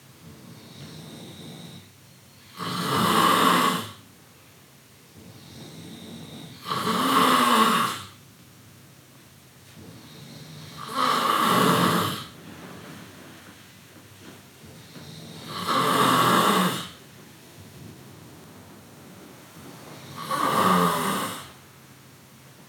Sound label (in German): Schnarchen